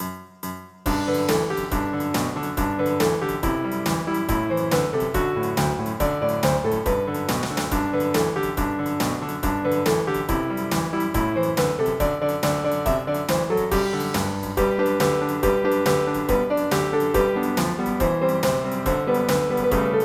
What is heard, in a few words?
jingle